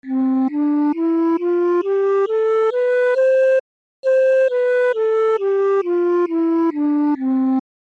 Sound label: Flute